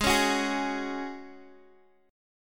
C sharp Suspended 4th G sharp

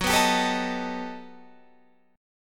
G Augmented Major 9th

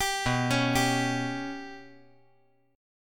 B Suspended 2nd Sharp 5th

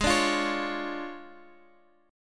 D Minor Major 7th Flat 5th G sharp

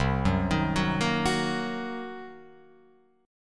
C Minor 7th Flat 5th C down Guitar Open F; Cm7b5 arpeggiated; JGuitar

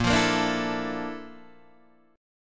A sharp Major Sharp 11th, Guitar, DADGAD

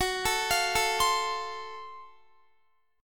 Gb Minor Major 7th Flat 5th, Guitar, chord; arpeggiated

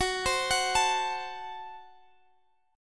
F sharp Diminished F sharp, Guitar Standard; arpeggiated; x,x,16,17,19,17

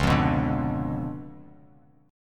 Db 7th Flat 9th; strummed; 9,8,9,7,x,x,x,x; JGuitar

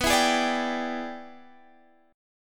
E 7th Sharp 9th B, chord

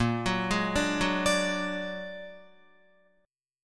Bb Augmented 7th Bb down Guitar 4E,4A,5D,5G,5C,6F; Bb+7; arpeggiated; JGuitar

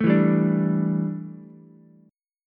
D Suspended 2nd Suspended 4th D down Ukulele; strummed

